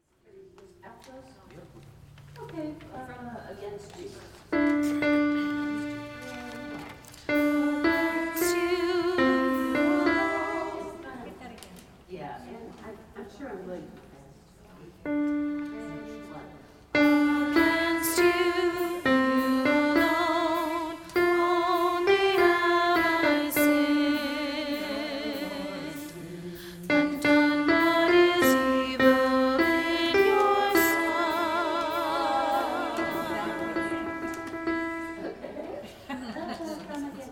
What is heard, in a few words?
alto; vs 2 ms 32 - 39